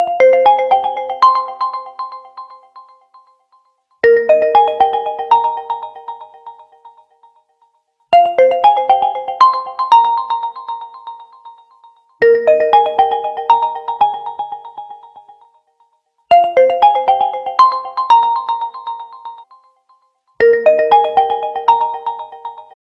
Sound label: oppo reno ringtone